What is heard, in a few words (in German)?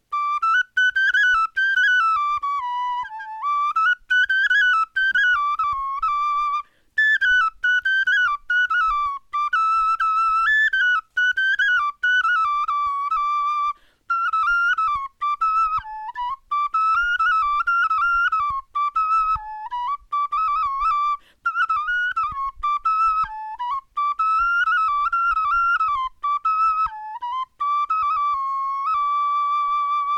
melodie